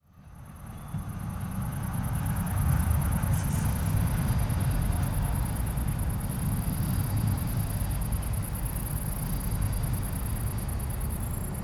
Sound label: train loop